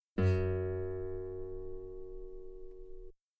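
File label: guitar F sharp 4 very long forte normal; bananenkeyboard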